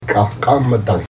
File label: Loud laugh